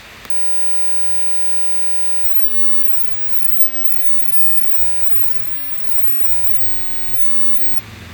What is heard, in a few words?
dogs